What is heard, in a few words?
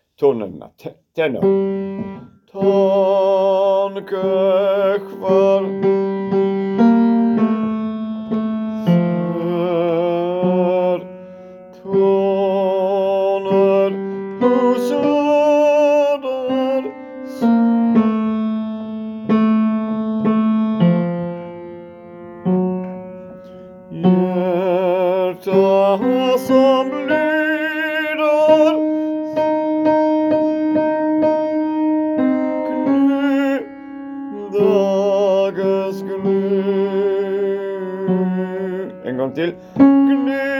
tenor